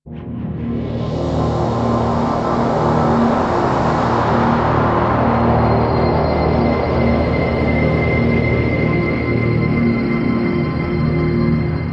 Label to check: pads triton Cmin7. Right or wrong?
Right